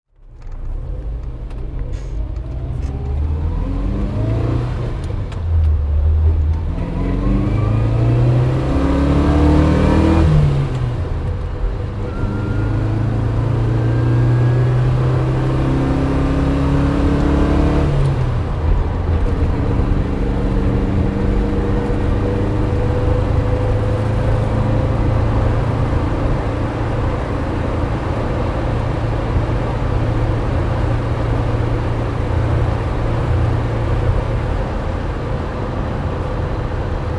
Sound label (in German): Saab 96 innen